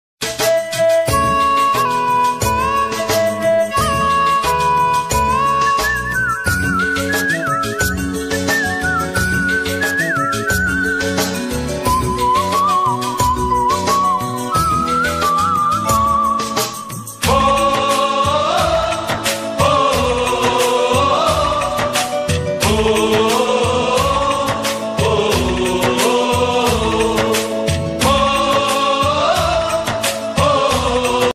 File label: instrumental ringtone basuri ringtone